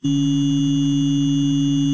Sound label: reversed; nullishere the broken script